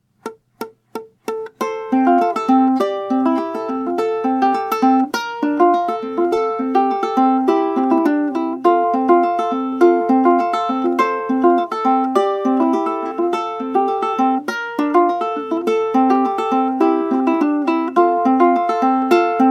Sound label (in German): Fingerpicking, ukulelen